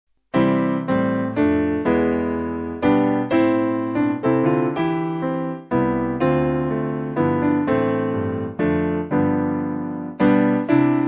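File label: Piano, Music Backing Files for Churches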